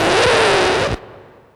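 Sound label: scratch, record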